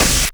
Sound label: White noise snare; Nu Dance Electro